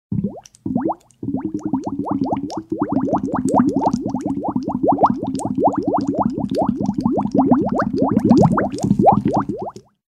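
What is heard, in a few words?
sound bubble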